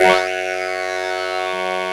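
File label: synth Flange talk; Best Service Gigapack 1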